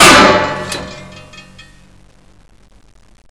metal, debris

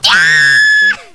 scream

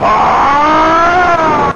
bahhh

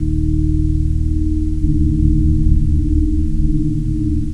the wall release

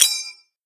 spoon, gren

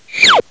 fall

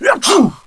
male sneeze; jcdenton

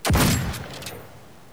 fire, weapons

